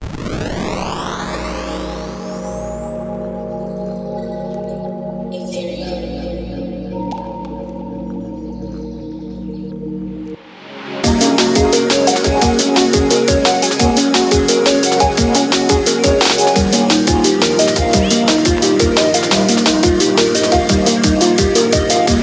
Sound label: Liminal Jungle & Breakcore; ジャングル系サンプルパック